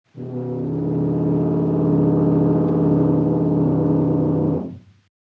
Multifónico

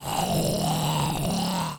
Voz tenor ord f

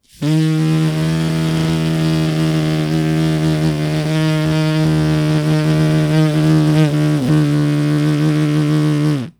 Voz inarmonico buzz bilabial f

